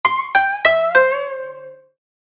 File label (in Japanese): 場面切り替え 琴 和風